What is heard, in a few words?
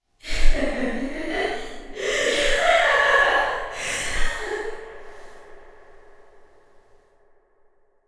Woman Laughing With Echo